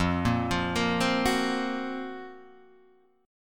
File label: F sharp Major Sharp 11th F